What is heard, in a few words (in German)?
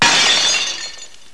GLAS